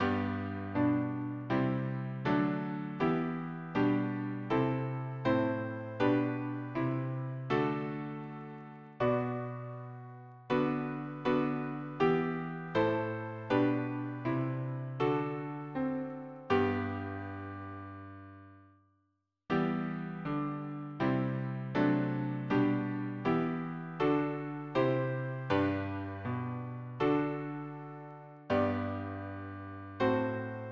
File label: harmony, piano